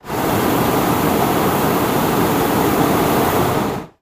Hot Air Balloon Burner, Medium Close, Medium On, Off, Long